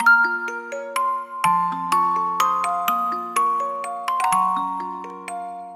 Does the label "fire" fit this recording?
No